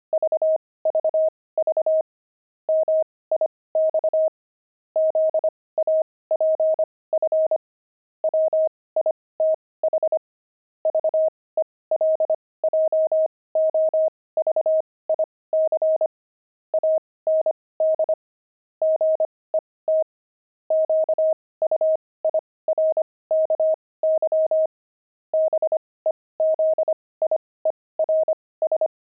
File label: pangram, intermediate pangrams files